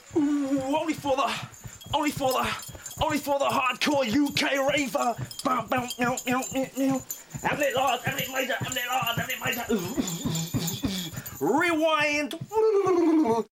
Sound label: Hard Core UK raver